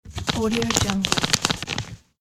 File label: unfold paper map or newspaper